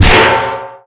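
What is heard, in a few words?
bat baseball hit robot; melee impacts; Garry's Mod; tf2; mvm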